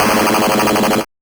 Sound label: Noise, 8-bit, Bitty Noises